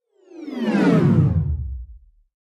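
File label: Descend Electronic Whoosh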